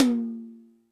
Toms 1, drum machine

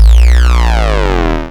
Vactrol Phaser Bass; 300 Drum Machines; DevSnd

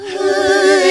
BACKWARD, VOCAL

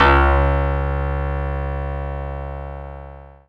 C#, Synclav FM PLUCKED; Big Fish Audio

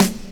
SD, Dance Mega Drums; Best Service; SD-HIP

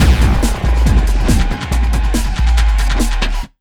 140, Big Beat Drumloops

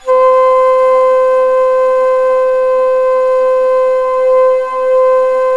BASS C3, BASSFLUTE; Historical Instruments; AKAI; USB Soundscan vol.23

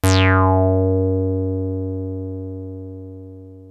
303 F#2, TB 303; Hollywood Edge; Giorgio Moroder Rare Synthesizer Collection

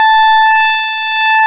PULSEMOD, ARP Synths; E-MU Formula 4000 Series Vol. 3; Analog Odyssey; ARP Synths II

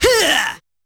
A4 Huuaah!, Yamaha PSR-E323 Sound Effect Kit

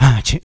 vox, Human Perc; Spectrasonics; Supreme Beats; World Dance

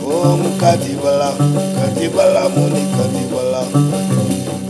O KATI, SINTIR PH; Spectrasonics; Heart of Africa 2

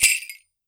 MIXED PERC; 90 s Sample CDs

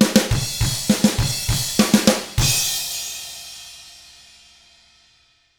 ROCK, Drums; ILIO; Double Platinum Drums 1

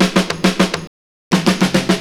DRUM FILL

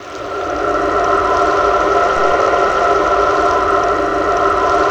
PHANT RAIN; Spectrasonic Distorted Reality 1; PHANTOM RAIN

